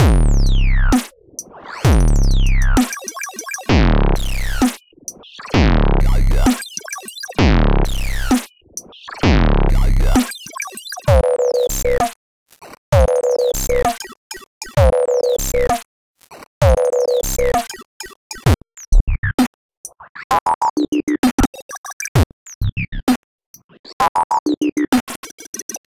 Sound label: on Dubstep Beat; Sequential